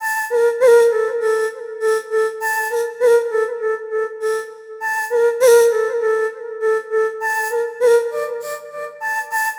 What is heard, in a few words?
Flute 100BPM Dmin; Everest; 99Sounds x Monosounds; Instrument Loops